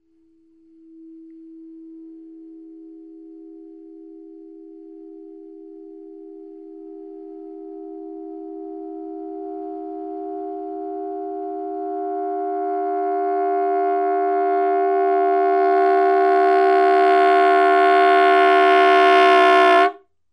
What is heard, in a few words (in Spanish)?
Morphing